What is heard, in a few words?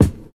high quality short bass drum e key, kick tuned to the E note; kick drum samples